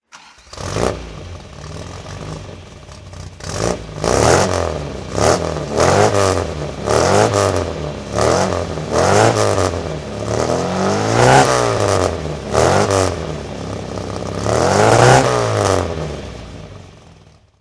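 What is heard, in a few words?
MG MGA coupe rally throttle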